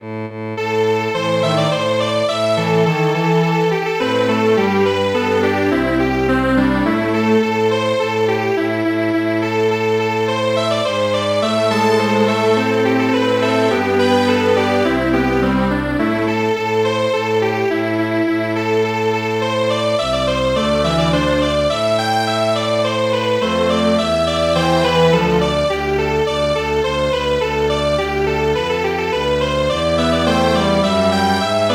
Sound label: Strings Quartet